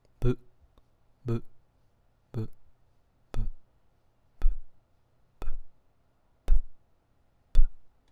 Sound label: hihat, beatbox